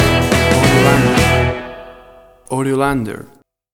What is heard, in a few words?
1960s Pop Club Stinger